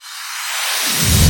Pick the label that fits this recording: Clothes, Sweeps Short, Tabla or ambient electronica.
Sweeps Short